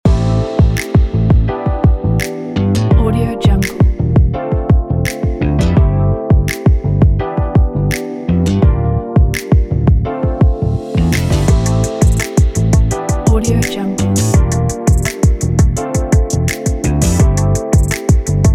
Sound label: chill lounge loop; seatools